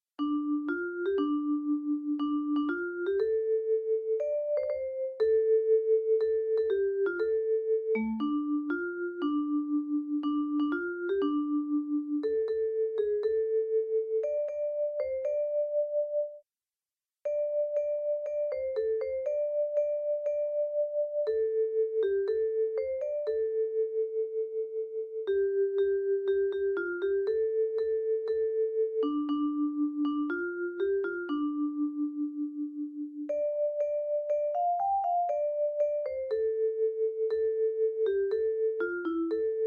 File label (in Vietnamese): nhac linh midi